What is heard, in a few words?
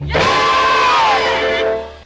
"yeah" crowd; ooh ahh